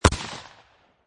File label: Shot Gun Magnum 12 Gauge Single Shot Exterior At 45'; Magnum Eagle Arms 12 Gauge; at 45 feet; Shot gun / Shotgun: Magnum Eagle Arms 12 Gauge, Single shot, Exterior, at 45 feet di